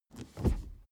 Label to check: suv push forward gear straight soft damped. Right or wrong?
Right